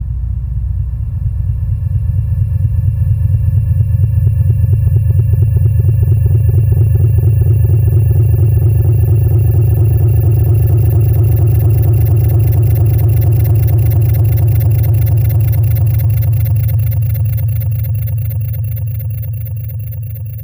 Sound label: Ambient FX, Ambience FX